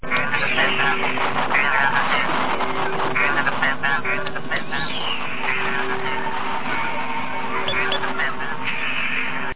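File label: Alien Probe Notification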